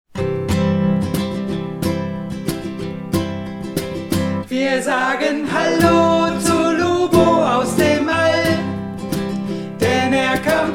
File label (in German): Lubolied zweistimmig